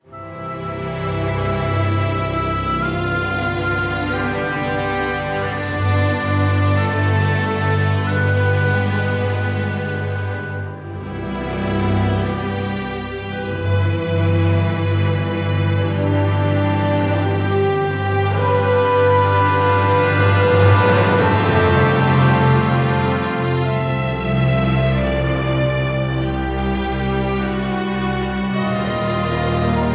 Open World BPM91 C; 4/4; Orchestra

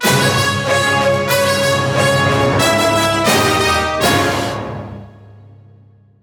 Fanfare BPM95 Db

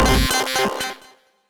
robot

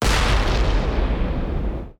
explosion

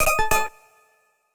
error

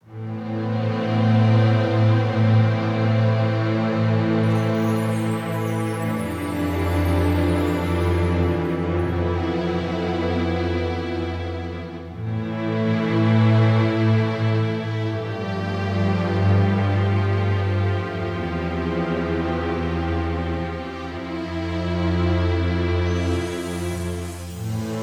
Field BPM82 Am; 4/4; Strings

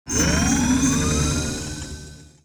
use magic

